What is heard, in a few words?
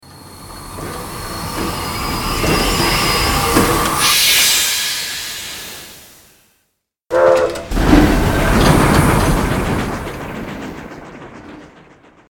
train stop start; Toontown Online